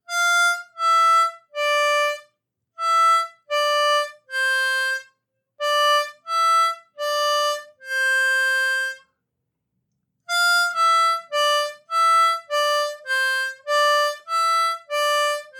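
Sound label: Triplets Descending, harmonica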